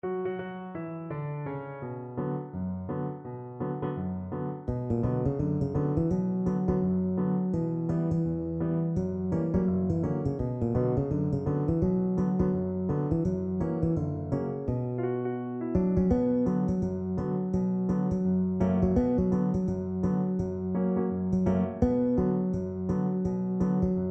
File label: bass guitar